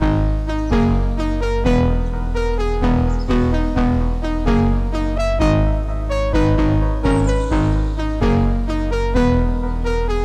Jungle, 128 BPM G# Min, Breakdown Loops, Tsunami House; Cymatics